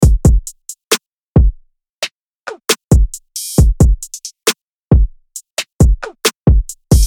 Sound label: RB 135BPM